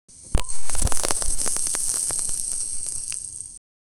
sizzling grill, boing

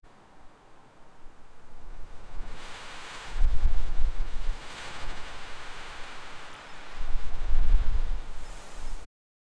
howling icy wind gusts; gale

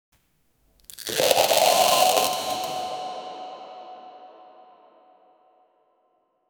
studio sound soft reverb

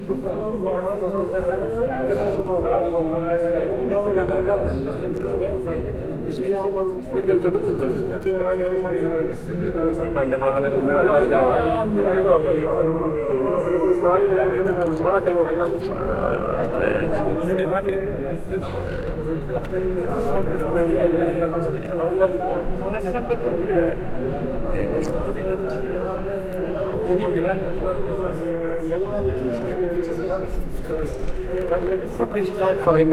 ancient lithuanian people

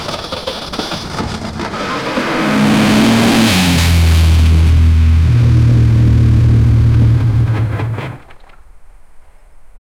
off road pickup engine

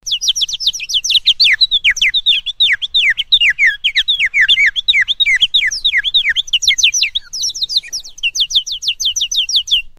an adorable ducken create, cute

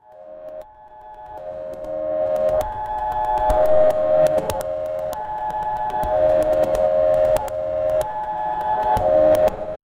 boing with 10 repetitions

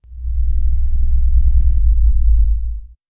object flying past the sc, bass hit

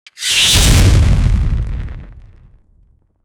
realistic bullet whizzing; air displacement

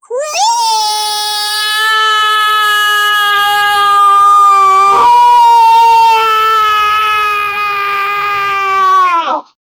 cartoon scream in a high; sound effect

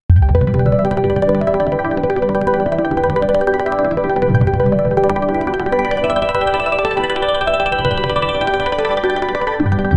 ambient synthwave a track; electronic music

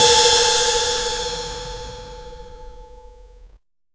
spikestrip open; deploy

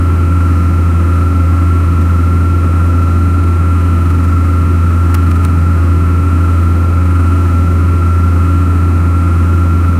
standard light aircraft, aviation

